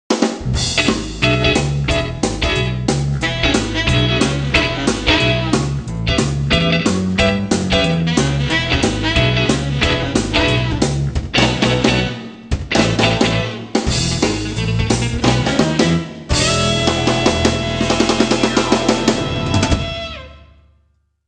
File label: rock n roll